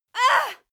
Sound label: female moan pain short; koerpergeraeusche; menschen; soundpool